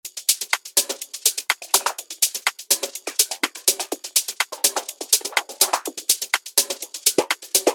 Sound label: Trashcan, drum loops for organic house; Basic Wavez; Dulus